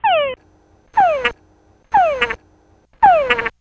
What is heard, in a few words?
tungara frog